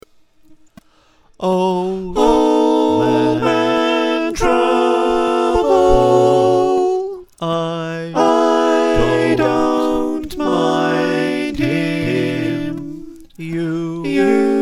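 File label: slow soprano alto tenor bass, choir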